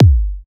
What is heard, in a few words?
kick machine